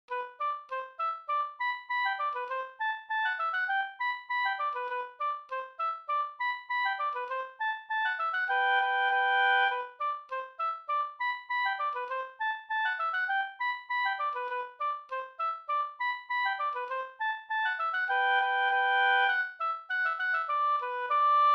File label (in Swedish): polka polkett; fr Brunn Orig